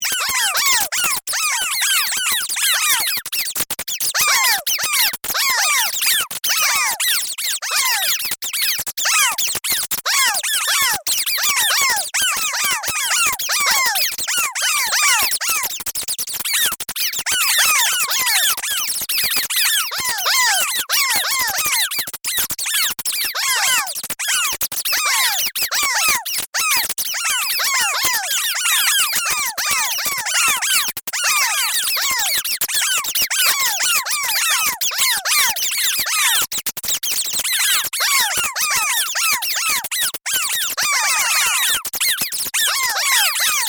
Created Ambience Rodent Plague, Various ambience created through sound manipulation; Artificial; Various ambience created through sound manipulation in FMOD